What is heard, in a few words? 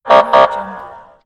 police siren